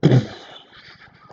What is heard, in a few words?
Explosion